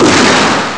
eigene explosion; ST-XX Amiga Soundtracker Sample Packs

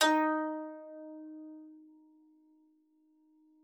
LAUTE D#4; Xsample VOL-8; LAUTE+FLG

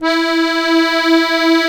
DIATONISCH, AKKORDEON, Alpine Volksmusik

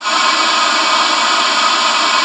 LUFT, OB D.AMORE; Xsample VOL-7

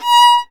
GEIGE, Alpine Volksmusik

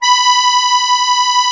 MUSETTE, AKKORDEON; Best Service; Alpine Volksmusik

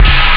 schuerfen; Amiga Soundtracker Sample Packs

